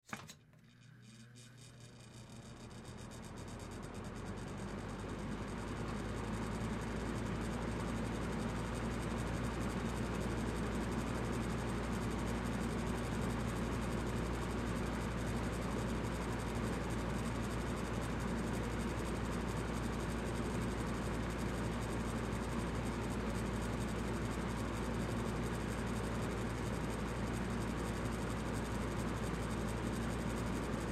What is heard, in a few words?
Ceiling Fan Medium; Electronics; Fans; Blastwave